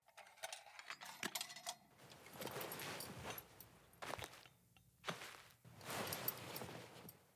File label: Sit Spawn Foley Stand Up Unarmed; Midrath; Dendria